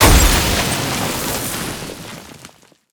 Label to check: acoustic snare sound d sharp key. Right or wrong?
Wrong